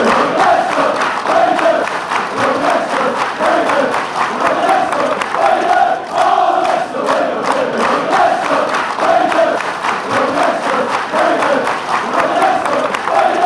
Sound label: we love you leicester we do, fan chants